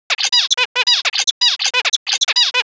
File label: random Sequence